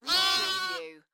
Lamb bleating; beanstalk audio

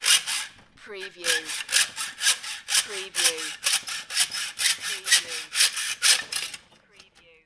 Sawing Metal

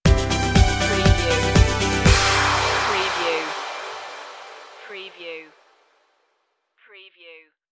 rise above stinger

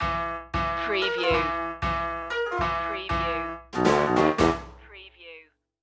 wildcard sally stinger

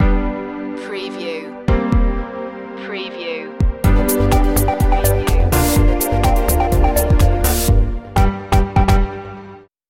groovy background stinger

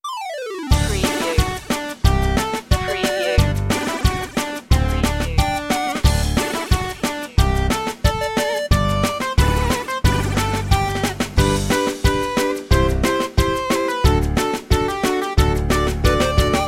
retro video game bubbles no sound fx

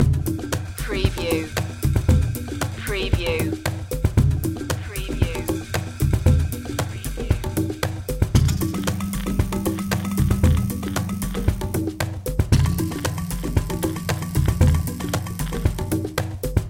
african voodoo loop

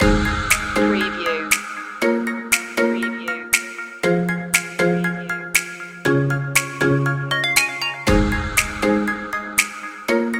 tropical summer loop

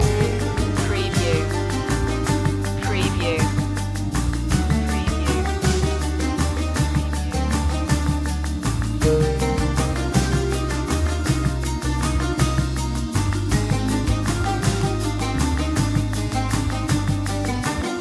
balkan

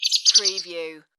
Flutter

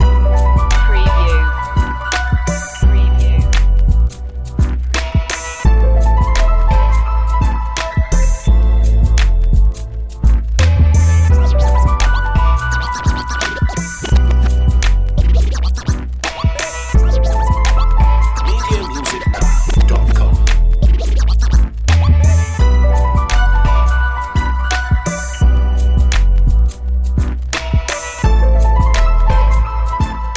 urban streets main loop

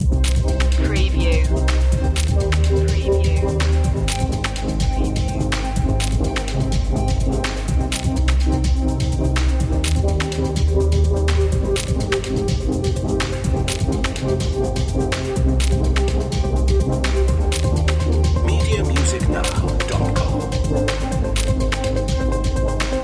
erased loop

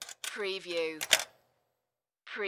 Slot Machine Insert Coin

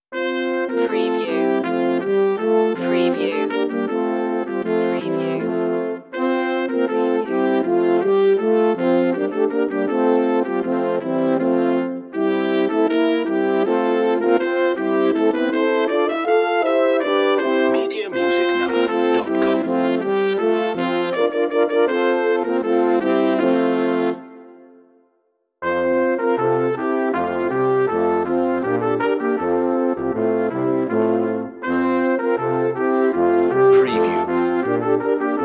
deck the halls brass band

